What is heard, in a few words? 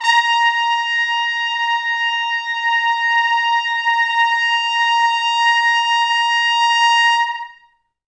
A#4 -L, Pop Brass; Best Service; ProSamples vol.19; AKAI